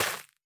缠根泥土破坏声

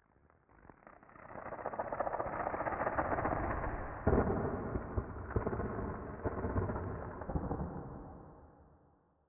下界荒地点缀声; ambient

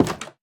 樱花木门开关声

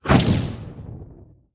潜影贝射击声

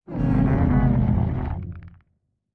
监守者环境声

